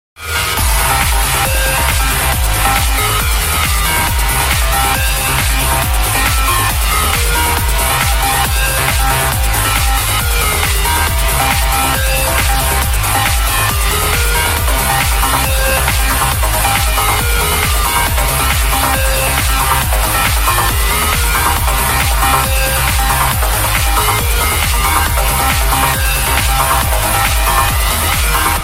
Uplifting trance

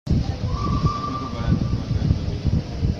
Stadler AM1 Whistle

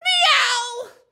Meow

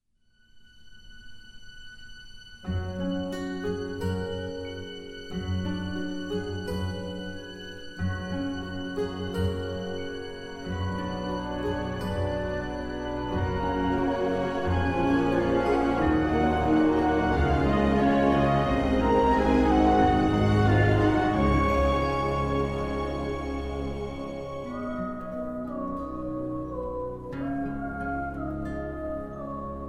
Intro Orchestra

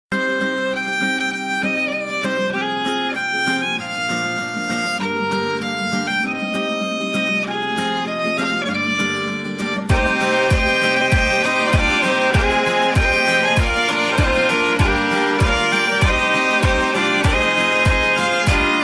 Awesome Guitar

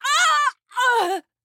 Yelp

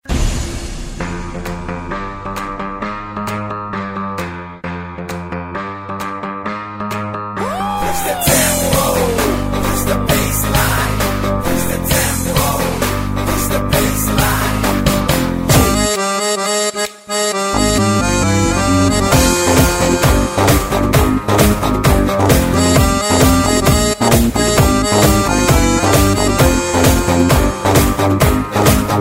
Rock N Roll

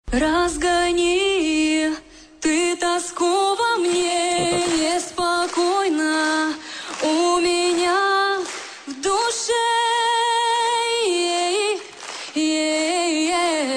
Lullaby

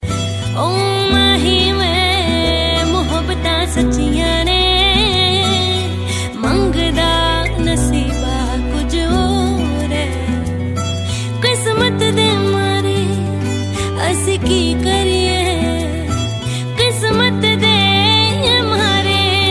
Unplugged

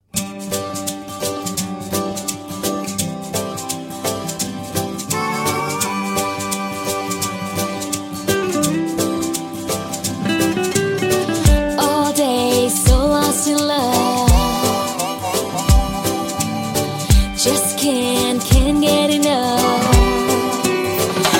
Guitar Mouthorgan

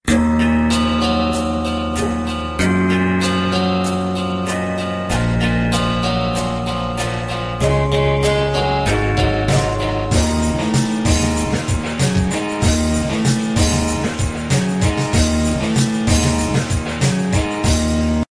Rock Solo Motorola

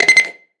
message; Bf2142

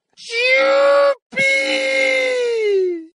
Yupiii

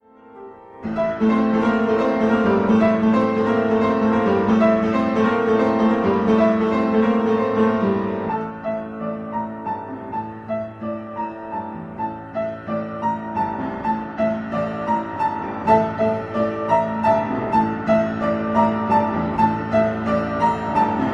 Piano; MortalKombat

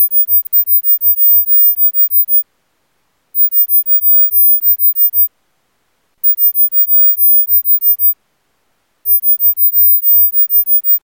High Hz

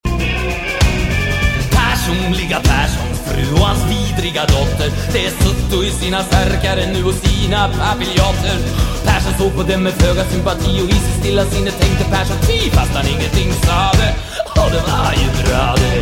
Swedish

